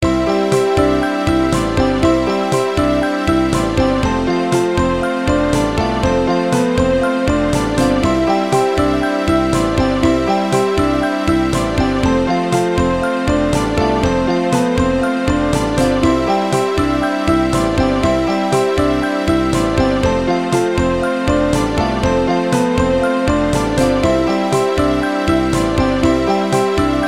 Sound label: GAME OVER